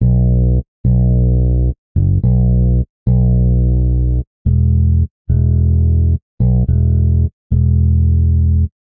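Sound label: Bass Chill B minor 108bpm; Guitar and Bass; Ableton; Factory Packs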